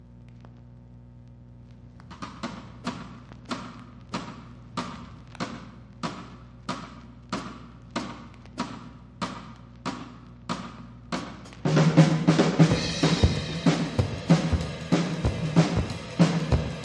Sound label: Drums